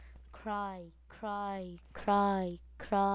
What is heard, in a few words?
cry